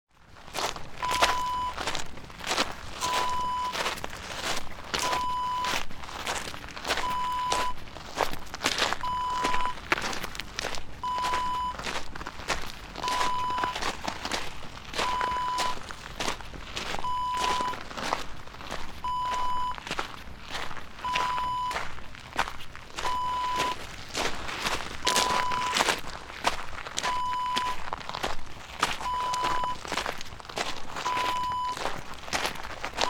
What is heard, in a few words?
footsteps on gravel